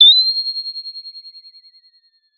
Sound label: Sound of a bird tweeting nicely